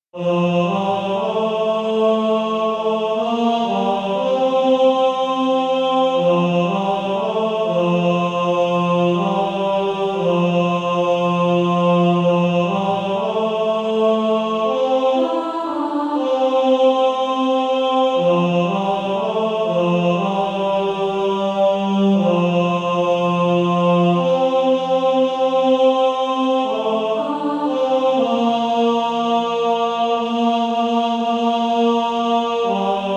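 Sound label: Male Choir, tenor 1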